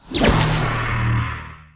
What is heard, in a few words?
grab power up; Nickelodeon Basketball Stars 3; ThrillGames